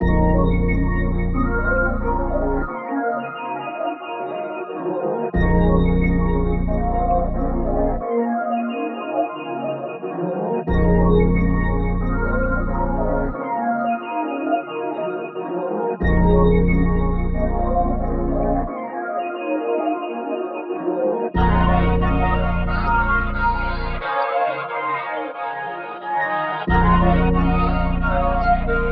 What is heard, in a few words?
90 Bpm Rolls